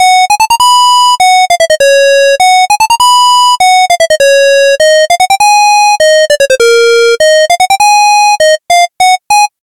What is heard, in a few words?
Beep Simple, ringtone; SKY IM-A830S-VEGA-Racer-2